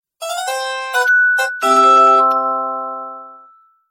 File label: Hungary BKK Jingle